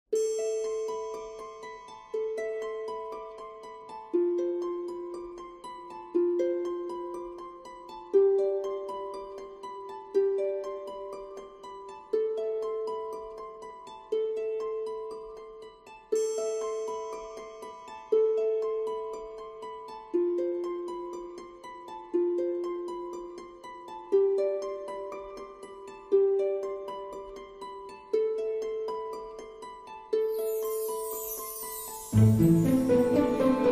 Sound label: Harp